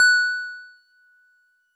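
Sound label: Wood Piano DX100 F#6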